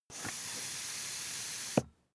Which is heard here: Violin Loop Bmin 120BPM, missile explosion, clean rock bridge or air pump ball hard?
air pump ball hard